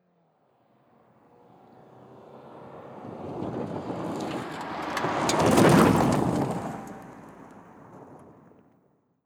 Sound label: suzuki passing